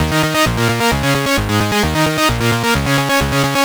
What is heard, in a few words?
retro disco Eb 131, synth loop; Acidized; Synth Loops; Zero-G; Soundclash Nu Dance Electro; 131bpm